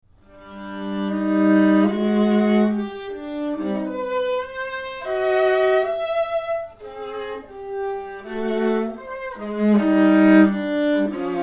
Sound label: Solo Viola